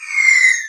nuke falling

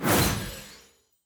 heroes call Of Triumph out; lumenir

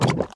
mud summon; wukong princess

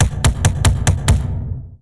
boss chest drum; boss mono

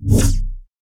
saurian brute attack

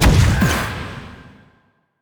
death's touch cast

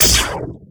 hero nivus attack

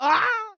Human Dead

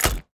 heroes tar bomb cast

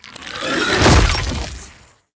heroes red Death explosion; kosmyr

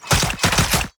deadly Spikes cast; heroes grimson

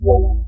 darter teleout

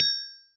Spell Refresh

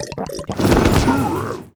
elixir of might drink and boost; tower brew Master

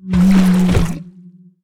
Whale spawn

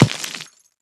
freeze start

